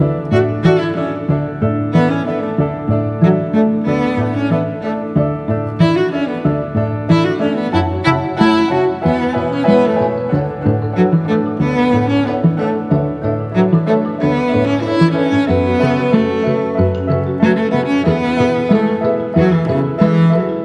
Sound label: Music Level Dreams